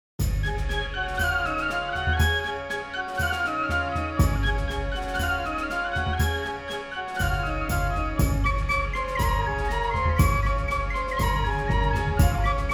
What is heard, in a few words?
irish song; Ethnic